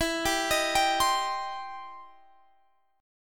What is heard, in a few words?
C Minor E; arpeggiated; Cm/E